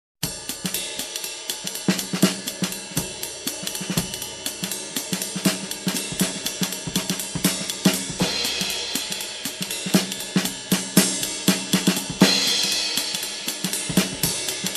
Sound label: Jazzy ride drum groove